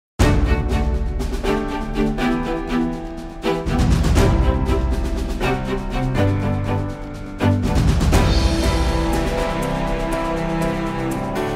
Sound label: outro music Only; omegatau